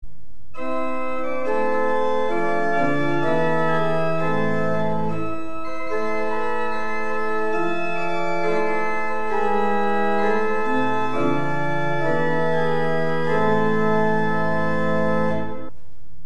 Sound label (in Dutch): orgel, Prestant 8', Octaaf 4', Octaaf 2'